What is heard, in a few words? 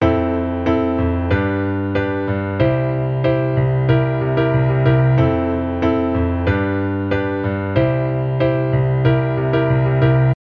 II I7 piano